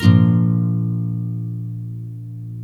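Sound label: NYLON, A# MAJOR UP, CHORDS; Spectrasonics; Hans Zimmer Guitars VOL-1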